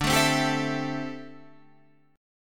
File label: D 7th Suspended 2nd Suspended 4th D; D7sus2sus4; strummed; 10,12,10,12,10,12